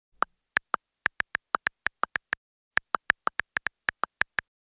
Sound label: Smartphone texting